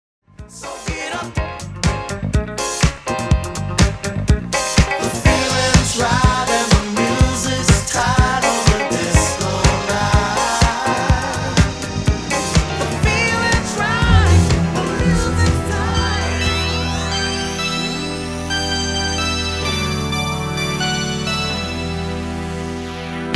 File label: Disco Mix; Mobile DJ